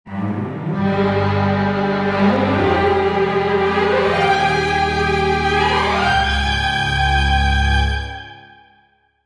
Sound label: comedy orch stinger